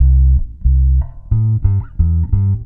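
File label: reggae bass C; Best Service Advanced Media Trax Modern Composer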